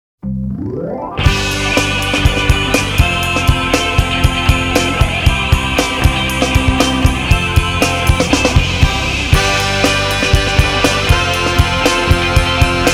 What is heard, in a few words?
Funky Pop; Soundzabound Volume 4